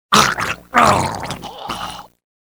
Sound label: throat, pain death, male; Soldier Of Fortune II Double Helix; XBOX